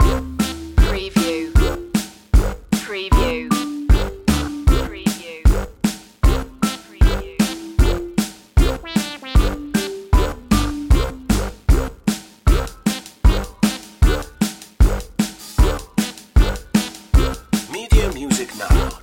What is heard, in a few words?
retro video game chicken run loop